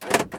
Car Door Unlock Peugeot 307

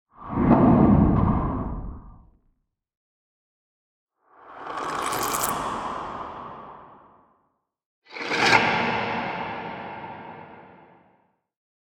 Abstract Wooshes

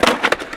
Flip Concrete board

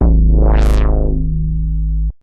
909 Sweep

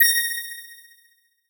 Magic Sound

